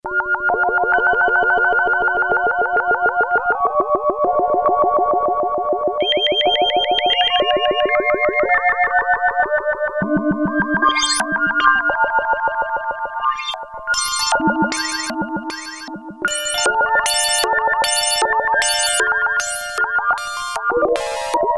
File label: Water Organ, Prophet-5; Sequential Circuits Prophet-5 (Ver.3); Factory Patch; File 3 Programs